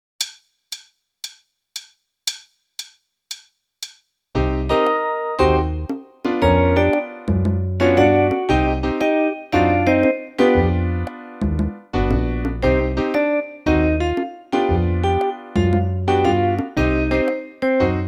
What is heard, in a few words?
Swing Jazz